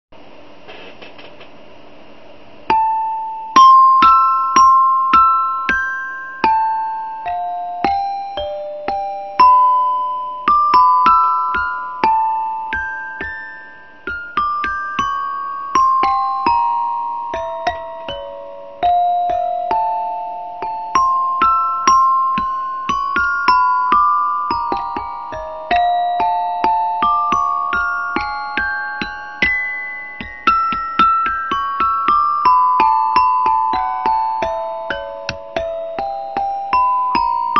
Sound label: Meditation, Haiku Chimes